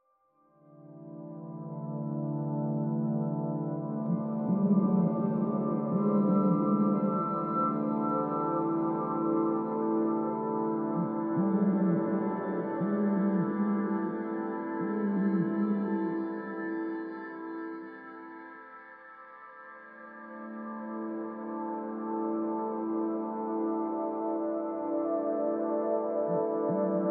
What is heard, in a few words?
No Piano; Never Alone